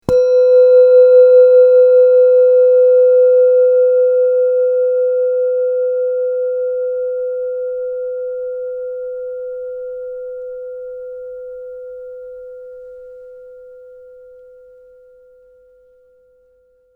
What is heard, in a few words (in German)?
klangschale sonne